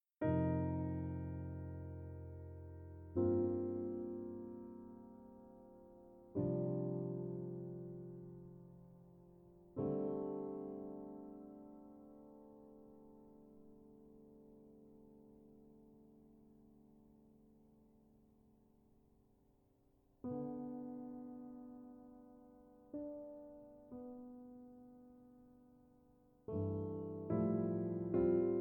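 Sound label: communion hymn high accompaniment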